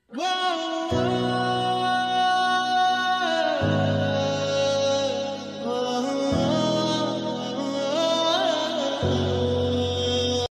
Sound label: sad ringtone